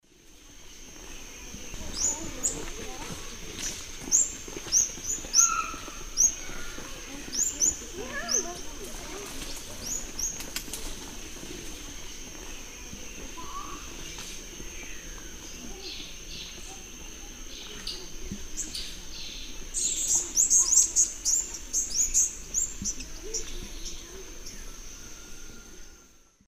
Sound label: Metopothrix aurantiaca, calls; S. America; Eastern Lowlands